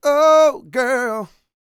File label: SING, Vocal Planet R&B & Dance; ILIO